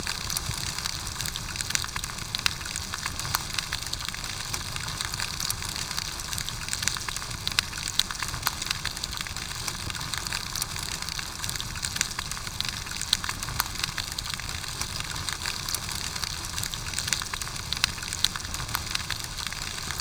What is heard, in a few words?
lantern lo freq